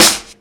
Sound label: dirty snare E key, steel snare drum sample tuned to the E note, Loudest frequency: 5116Hz